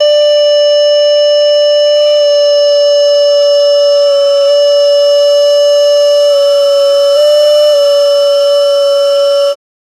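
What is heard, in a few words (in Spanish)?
sonido realista del instrumento, wind instrument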